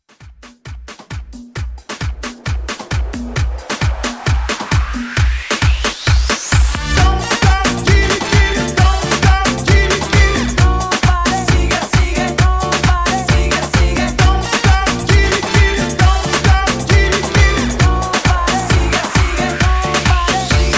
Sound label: Latin Hype Re Drum